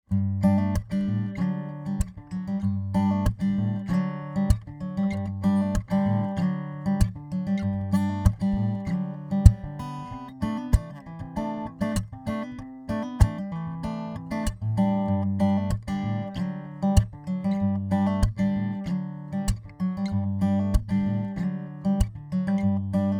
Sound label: ibanez aam700ce percussive contact pickup